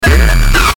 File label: Hit Industrial Distortion Bump; Blastwave